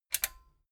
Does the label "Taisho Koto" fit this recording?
No